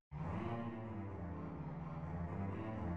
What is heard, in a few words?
Ring Leitmotiv, mid